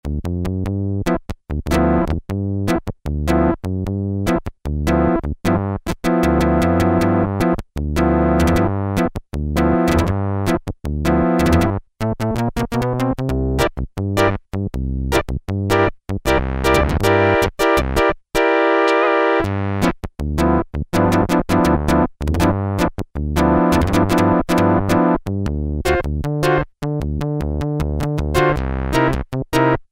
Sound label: Percussive Synthe, Korg Poly-800